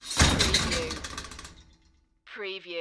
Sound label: Fireplace Door Open